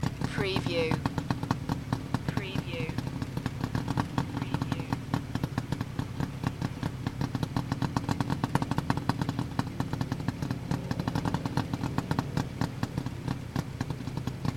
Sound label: idle tuctuc engine; loop